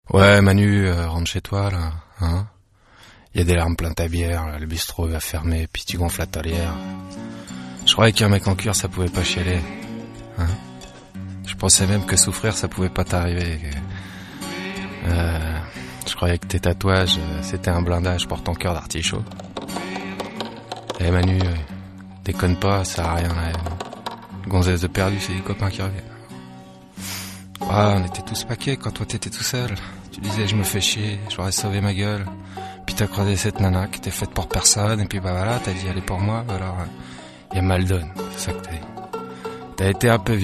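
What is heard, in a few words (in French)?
chanson parlée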